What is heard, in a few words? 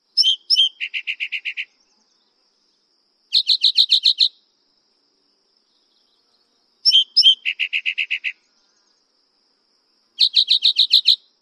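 songs, Spotted Towhee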